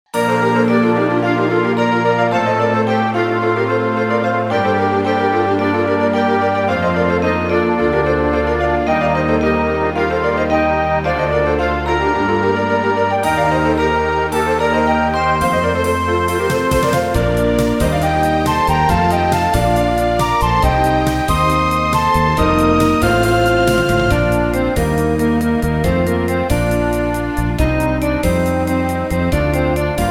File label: inst yell